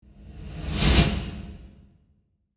Whoosh Suction, Swirl, Accent, Transition; Production, Element, Imaging; Whoosh Sound Effects

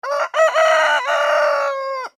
animals cockrel crow, Cockerel/Rooster Crow; ' Cock - A - Doodle - Doo '; Birds

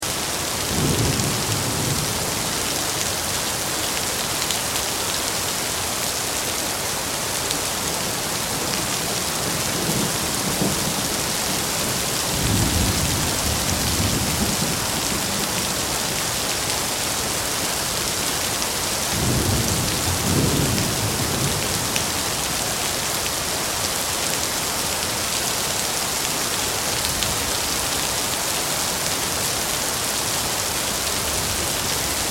Weather, Thunderstorm, Rain, Loop

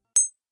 Glass Button, button sound effects; multimedia

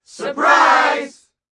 Crowd Surprise, Small party crowd; saying "Surprise!"; Lynne Music

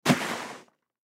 Duffle Bag Full Toss Slide Cement; Duffle Bag Full Toss Slide Long Cement; Slides; Foley; Blastwave

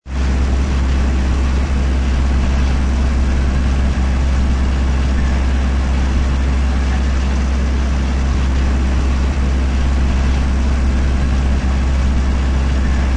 Sm Frm Trctr, Small farm tractor drives; Tractors; Vehicles

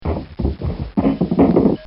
Falling Down Stairs; Injuries; Combat

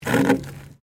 Ice Freezer Scrape, Frezzer Ice being scraped; Scrapes (Sound Effects:Objects)

